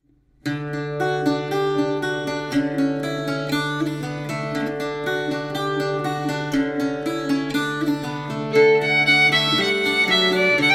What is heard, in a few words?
Air Tune, Irish melodies